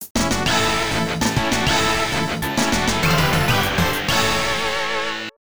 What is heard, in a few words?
Yippie! Stage Clear BGM; Sunset Riders; Arcade